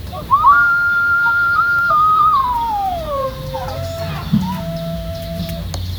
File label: cheerful village ambience, birdsong